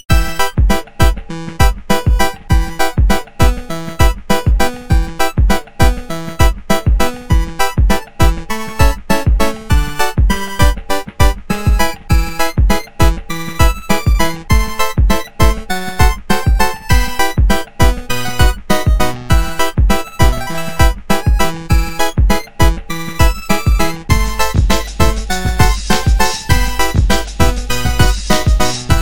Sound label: Midi Tetris